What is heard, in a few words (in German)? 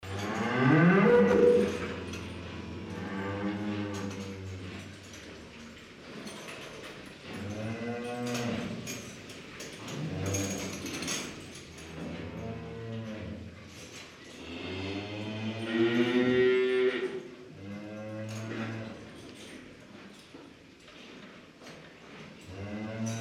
Sound Kuh